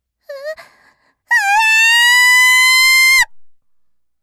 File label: kyaa; gaya